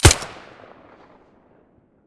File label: silencer fiveseven stereo, weapons